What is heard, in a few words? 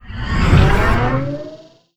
sfx science ship moving out; Stellaris; nemesis